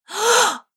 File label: Female Astonished Gasp; Gasping